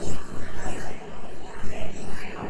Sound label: summoner summon; propack